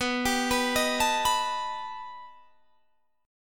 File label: B Augmented 7th B down Guitar Standard; arpeggiated; JGuitar